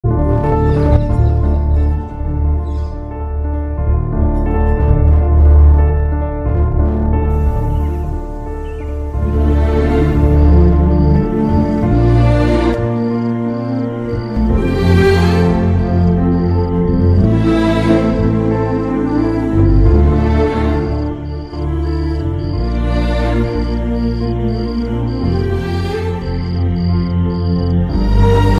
emotional bgm; pawan kalyan; jalsa; trivikramsrinivas

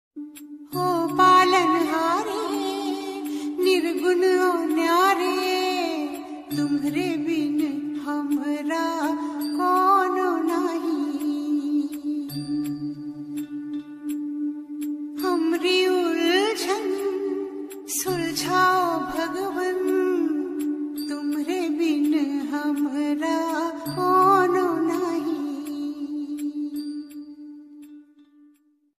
ringtone bhakti ringtone; radha krishna ringtone

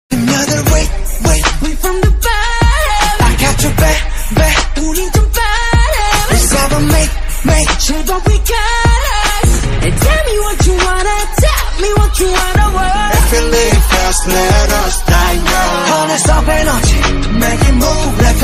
english ringtone, attitude song ringtone

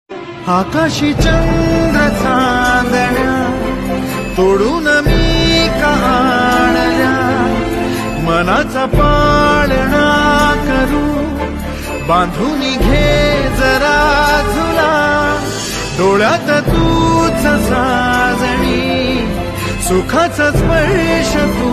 marathi song ringtone; kakan movie

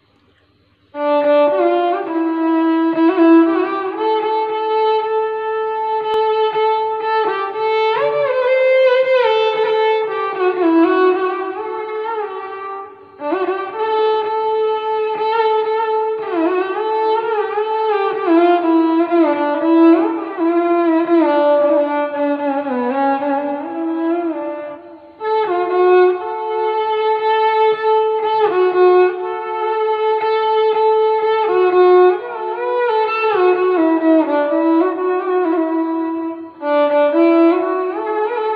violin

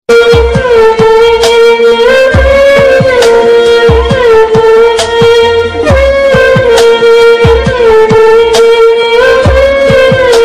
amma love bgm; ram movie bgm

